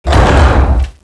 crane lift stops

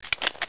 ingram clip out

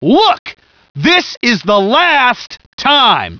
hurt soldier

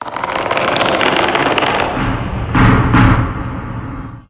creak

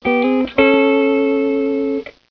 guitar riff

